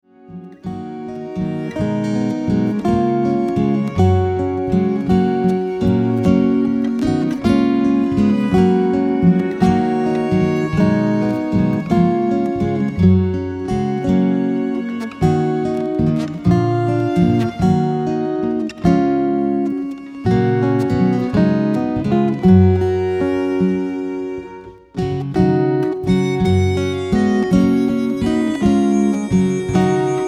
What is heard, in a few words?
In Dulci Jubilo, solo guitar; early carols for solo guitar